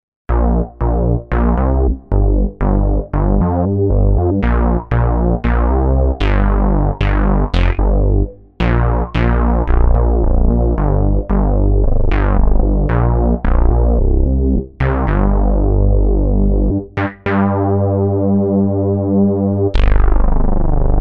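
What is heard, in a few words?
Bass Raver ARP, synth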